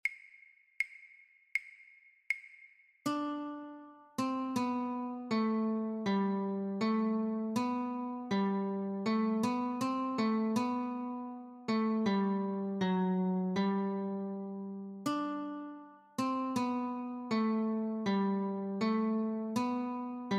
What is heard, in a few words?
Notes, guitar; Deck the Halls; christmas